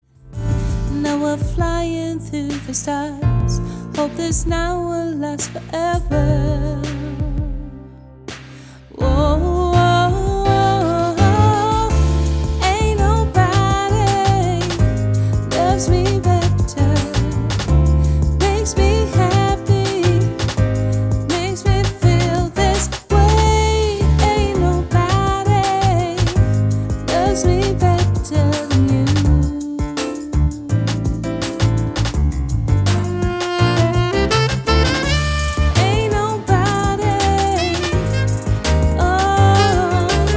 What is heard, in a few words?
Lounge